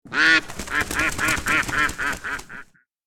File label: Flock of ducks quacks and wings; bird